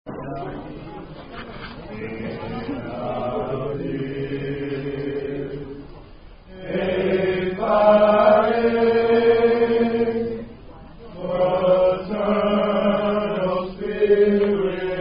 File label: Fraternity Chant Song; Alpha Hymn; Alpha Phi Alpha Fraternity Chant Song